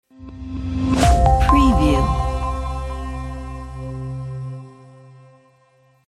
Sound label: fresh brands